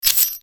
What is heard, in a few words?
coin and money bag